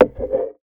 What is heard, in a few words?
Percussive Hit, contact mic